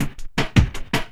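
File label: LOOP snare drum, Drum & Bass, 160 BPM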